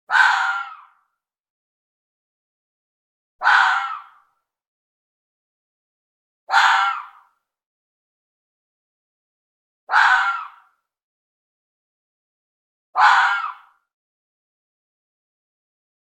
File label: animals fox red mating call long; sound effects one